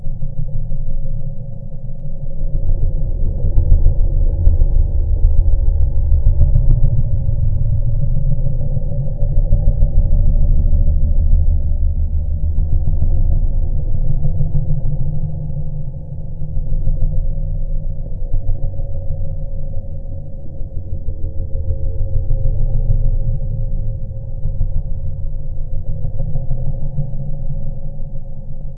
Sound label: Loop Creepy; Doom 3